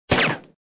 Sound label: country, weapon